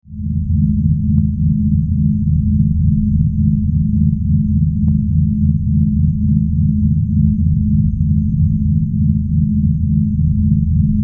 Spaceship Drone Hum, Spaceship, Drone, Hum, Flutter; 5.1